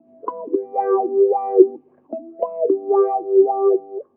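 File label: guitar 1, 112 bpm, Funk; USB Soundscan vol.41; AKAI; Roots Funk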